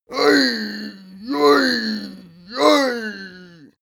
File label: male cheer playful; koerpergeraeusche; menschen; soundpool